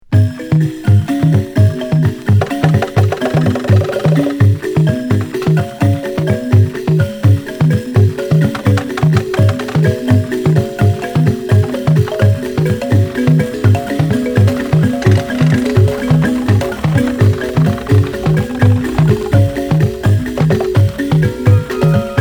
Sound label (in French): le quatuor de saxphones; 電気増幅したサックス